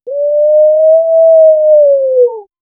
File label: weird attention grabbing